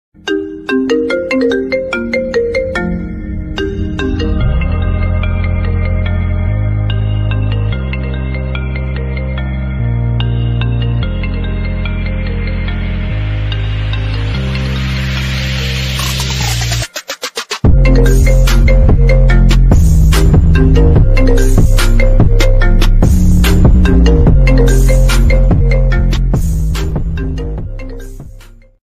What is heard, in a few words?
iPhone 15 Ringtone, iphone 15 original ringtones